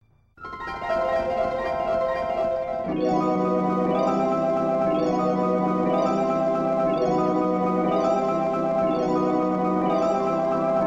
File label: Mystery; 1500 series collectors box data east gamadelic 1993; Transform ・Treasure Box